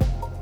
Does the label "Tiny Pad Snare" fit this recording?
Yes